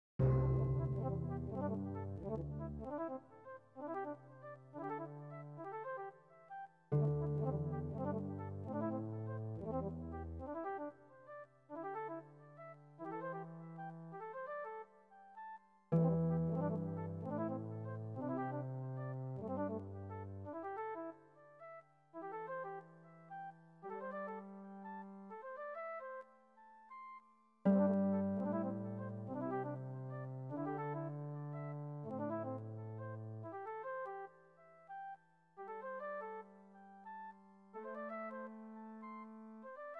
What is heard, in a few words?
echoes